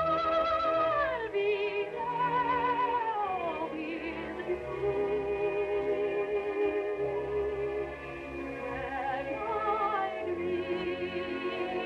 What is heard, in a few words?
Tango Melody; The Cocoanuts